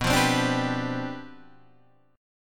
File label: C Major 7th Suspended 2nd Suspended 4th B, chord